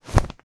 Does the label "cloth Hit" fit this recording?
Yes